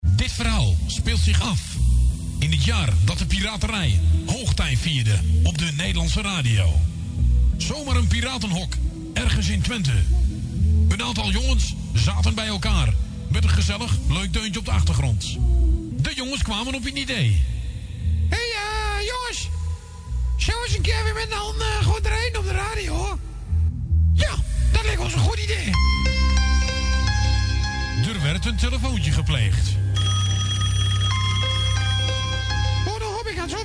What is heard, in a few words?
Jingle; Audio Fragmenten